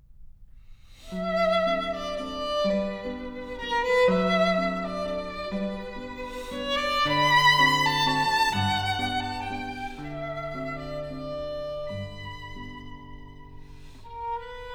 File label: Waltzes for piano